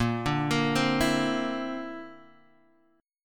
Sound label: D 7th Suspended 2nd Sharp 5th Bb